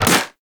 Primary Shot, Weapons; Warframe; Sapient

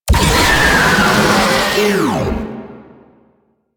Pain, Vocals; Warframe; Proto Infested; Babau

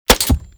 Shotgun Mech; Warframe; Tenno; Alchemist

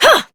Exert Adult, Melee Swing; en; New War; Adult Operator

